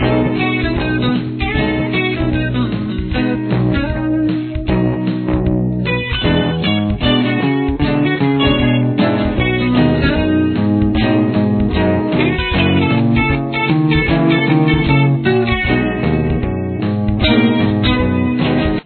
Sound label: solo